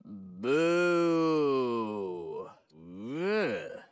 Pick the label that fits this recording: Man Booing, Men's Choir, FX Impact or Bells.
Man Booing